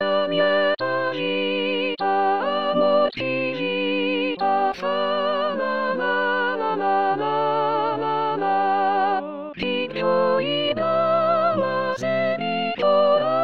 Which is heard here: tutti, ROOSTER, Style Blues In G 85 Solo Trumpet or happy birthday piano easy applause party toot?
tutti